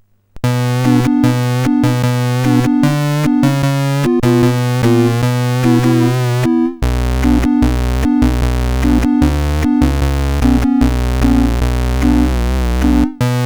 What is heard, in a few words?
sid CSG8580R5